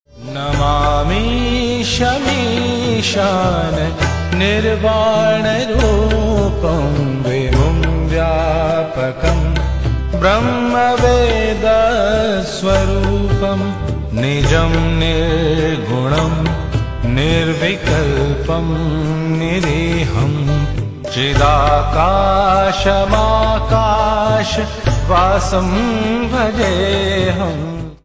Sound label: Rudrashtakam Stotram Ringtone; Shiva; gods